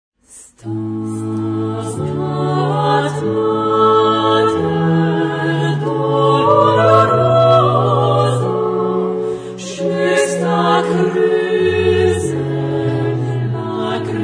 Stabat Mater dolorosa (female), chorus; Charpentier

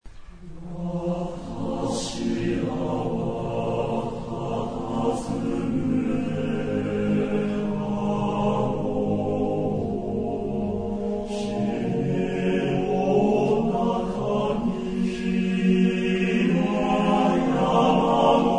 male, chorus; Mata aru yoruni

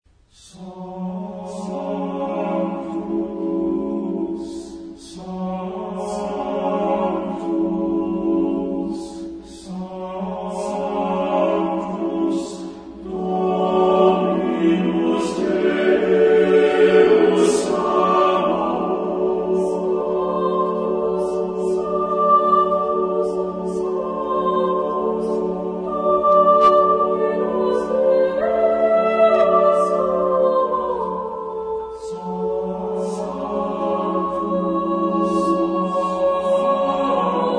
Mass for Double Choir Sanctus Benedictus; Martin; chorus

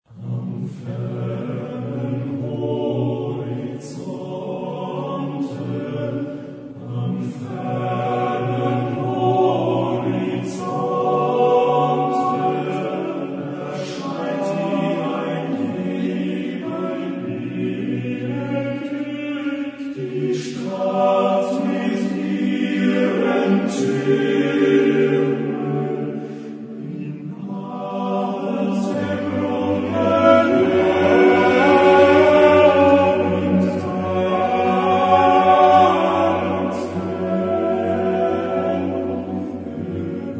lieder, chorus; Mendelssohn; 6 lieder Op50; Wasserfahrt

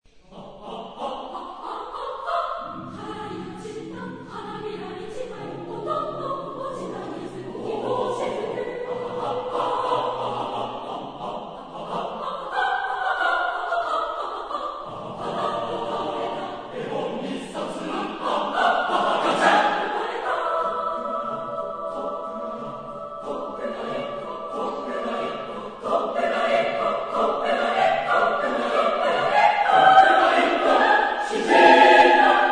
chorus

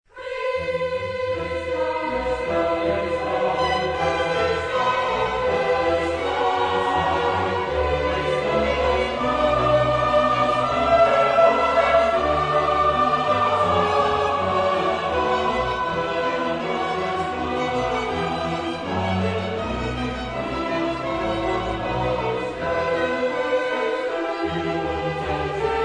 Cantata, chorus; Bach; BWV4; Christ lag in Todesbanden

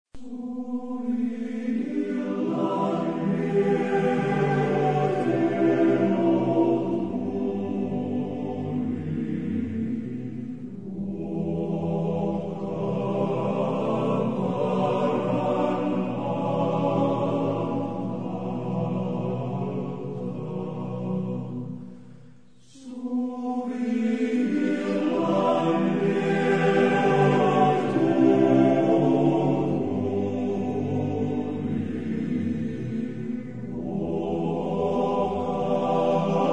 (male), chorus; Madetoja; Suvi-illan vieno tuuli

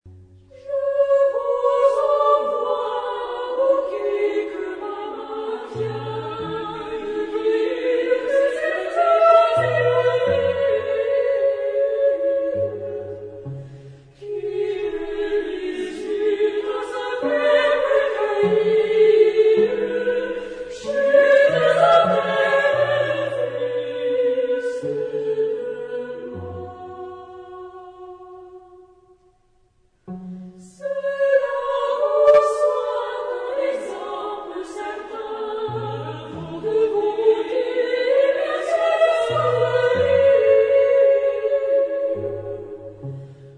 Chansons, chorus; Martin; Sonnet

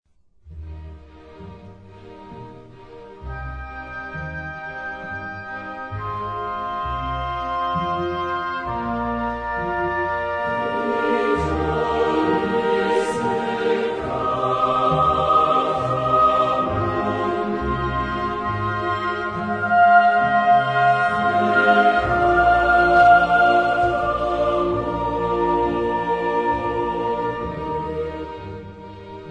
chorus; Mendelssohn; Gloria in Eb; Qui tollis

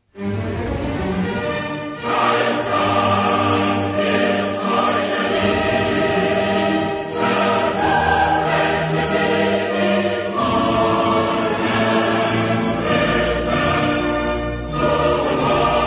Oratorio, chorus; Mendelssohn; Elijah Op70; Alsdann wird euer Licht hervorbrechen

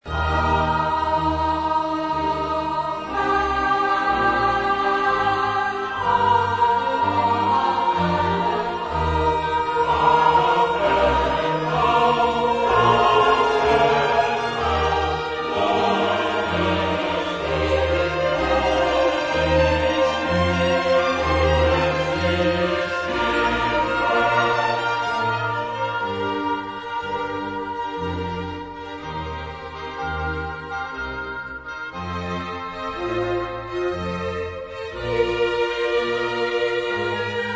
Cantata, chorus; Bach; BWV140; Wachet auf ruft uns die Stimme

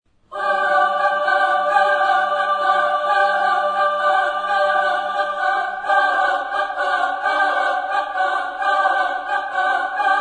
Manyoo renka, Ame no hi (female), chorus; Nobunaga

